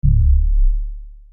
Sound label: Juno Bass